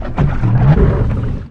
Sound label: jetski no gas start; hl2